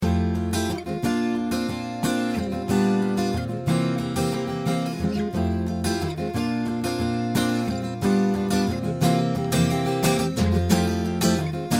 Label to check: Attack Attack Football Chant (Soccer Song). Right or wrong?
Wrong